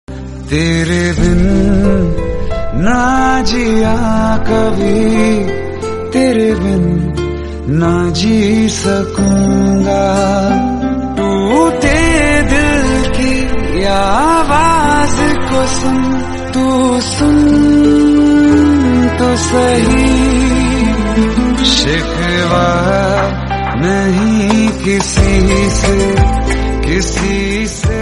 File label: Hindi Love Emotional Song